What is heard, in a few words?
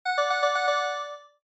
Quiz Ding Dong Mid